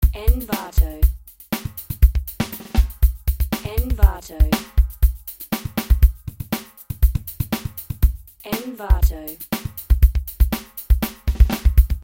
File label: rock drums 7 bars at 4/4 timing